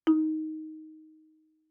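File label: Marimba cord fortissimo Eb4; MIS Pitches - 2014; Percussion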